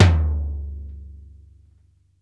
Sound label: FLOOR, Drumworks; Sampleheads; New York City Drumworks VOL-1